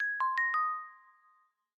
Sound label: Wireless Charging Started Pure; LG Wing 5G Verizon